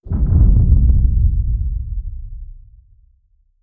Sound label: distance neutron hit layer; apocalypse